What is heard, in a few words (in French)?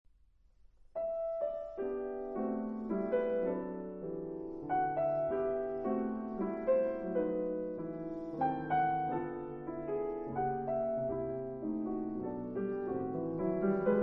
Quatuor piano